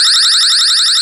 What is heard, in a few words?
CHIRP; Best Service Dream Experience; SF+EFFECTS